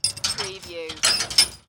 Chain Metal Clanging

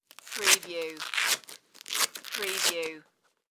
Bulletproof Vest Off; sound effects bible

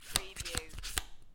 Playing Cards Flip Three Cards Off Top Of Deck; Casino / Poker, Cards & Dice

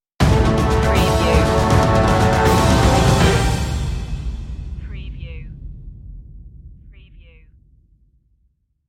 land stinger

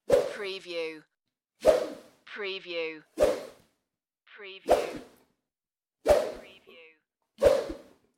Swish Whoosh Swoosh Sounds

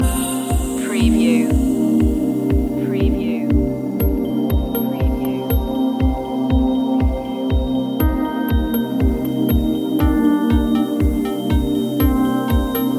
downtempo sunset loop

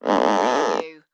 Creak Rubber Stretch

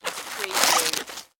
Cabbage Remove Knife

